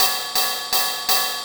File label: Breakbeat, Drums & Percussion